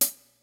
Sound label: PHAT TRAX KIT, DRUMS; Kid Nepro; Elite